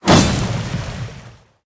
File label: sword out of; smite; god odin